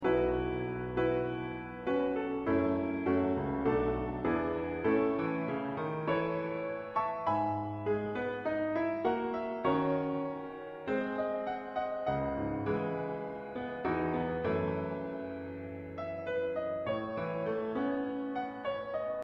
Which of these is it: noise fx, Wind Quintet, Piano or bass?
Piano